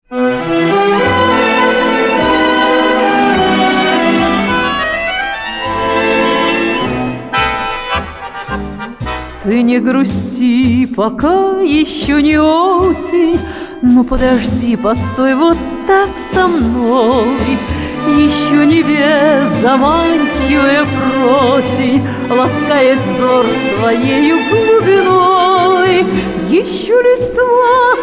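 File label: piano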